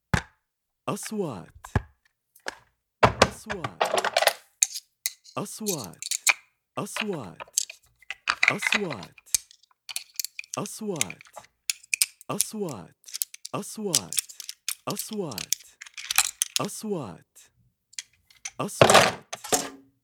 plumbing screws scrap bolts metals irons

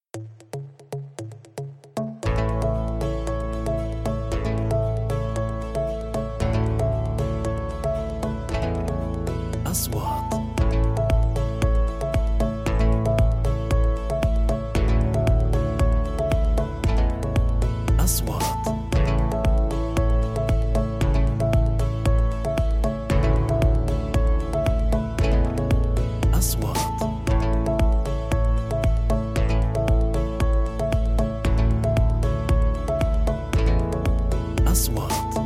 Music Corporate

